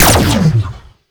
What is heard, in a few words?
Fire Shot; Duviri; Incarnon Cestra; Weapons